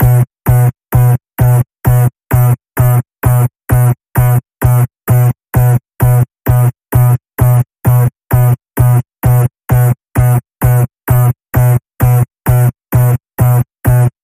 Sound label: Digital Rewind Pulse, B